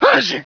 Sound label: sneeze